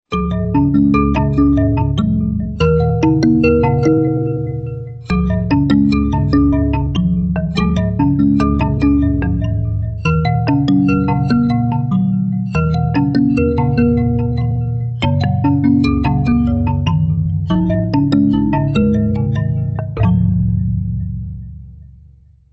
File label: Vivo V30 Lite Ringtone